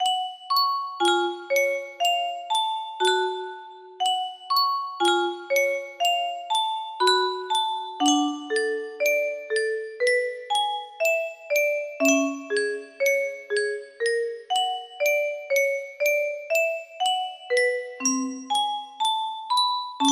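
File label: music box melody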